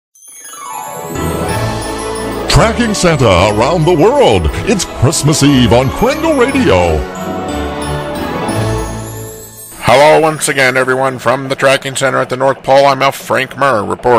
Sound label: sleigh bell tuning continues